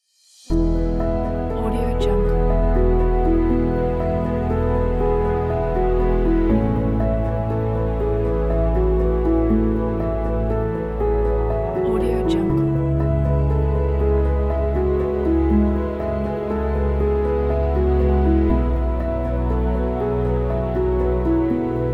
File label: atmospheric background